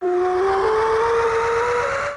Sweet Scent; Pokemon Essentials; Pokemon Iberia V1.03

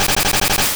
Mud Slap; Pokemon Essentials; PKMN Estelar 1.3

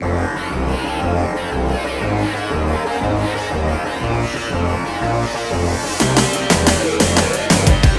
BOSS Intro, Music; Krang; TMNT

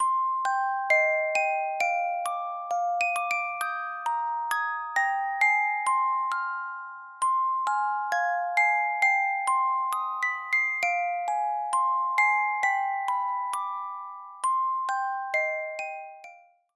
Lullaby for my Sister (Music Box); Clair Obscur - Expedition 33 (Original Soundtrack)

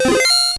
GUI save choice; Pokemon Essentials; PKMN Estelar 1.3

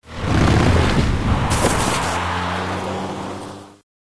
HIT DUST; Full Throttle; ScummVM

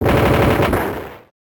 Mining collapse; Pokemon Essentials; PKMN Estelar 1.3

